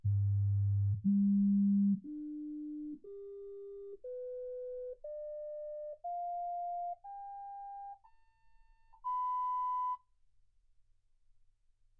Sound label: sinus tones 48kHz